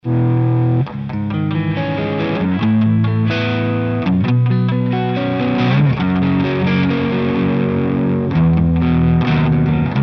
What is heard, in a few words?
amt p1 low gain; AMT Electronics